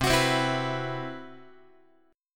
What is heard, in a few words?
C Major Sharp 11th, Guitar; CM#11 strummed